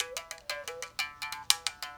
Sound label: mouth bow, Africa